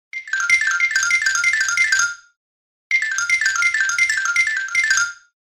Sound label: Ant Attack; Vodafone Smart-First-6; ringtones